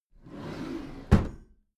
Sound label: packed drawer smooth close regular